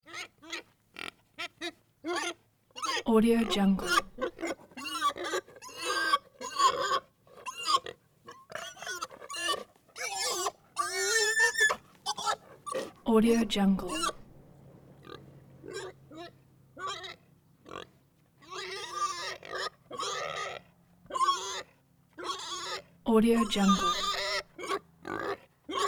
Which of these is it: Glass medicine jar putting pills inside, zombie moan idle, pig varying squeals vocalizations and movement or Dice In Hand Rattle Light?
pig varying squeals vocalizations and movement